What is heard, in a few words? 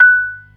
PERC B 3; B3 FULL